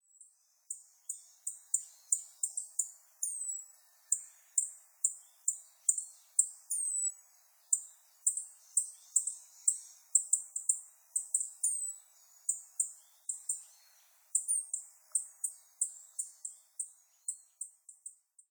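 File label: yellow bunting, minamiaizu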